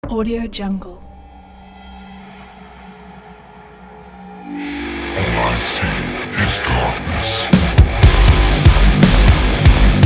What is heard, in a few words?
dark industrial metal